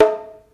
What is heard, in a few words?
gendang anak